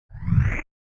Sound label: Machine Charge Up; SoundsCrate